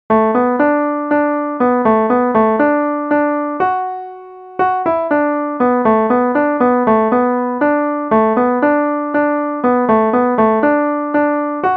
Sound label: piano slow